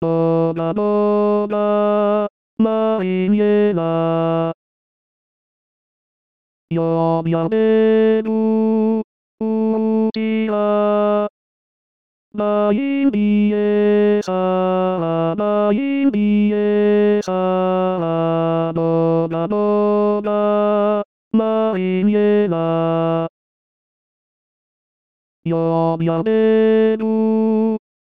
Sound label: Boga baryton